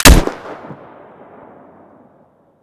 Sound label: saiga shoot, weapon; metrocraft